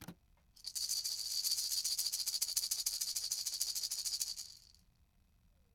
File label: maraca